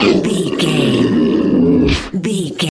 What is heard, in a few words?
troll monster death slow